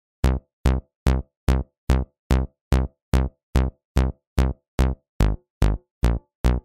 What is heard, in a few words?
bass